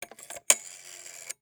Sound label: Telephone Rotary Dial